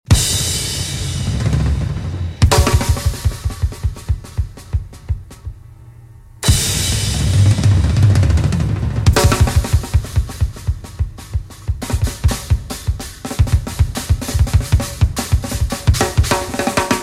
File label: drum song